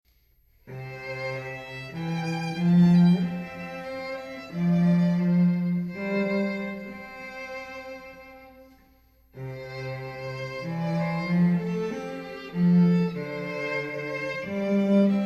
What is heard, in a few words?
Be Thou My Vision violin cello; Traditional Irish